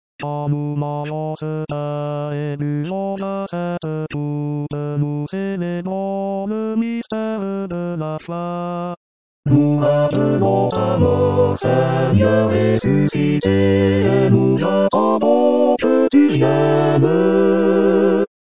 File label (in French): Quand nous mangeons ce pain, Chorale